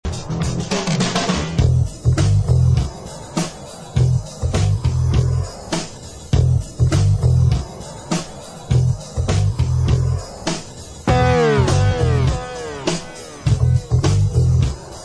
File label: Instrumental